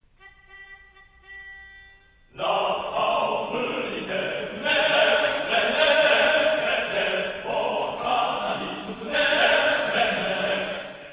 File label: gun